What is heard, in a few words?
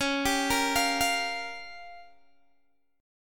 Db 6th Flat 5th, Guitar, Open G